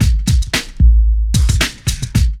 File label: LOOP; E-Lab; X Static Goldmine 2